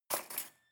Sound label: cutlery cake fork drawer grab hard